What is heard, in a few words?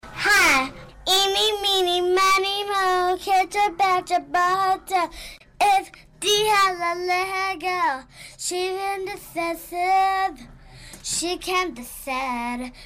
enney meeny, ringtones children